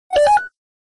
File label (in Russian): Validator